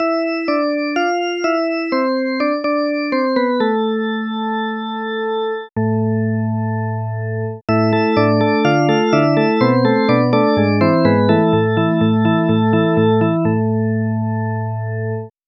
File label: drawbarorgan